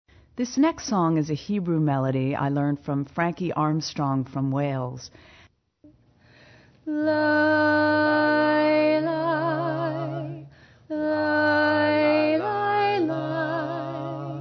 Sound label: jewish melody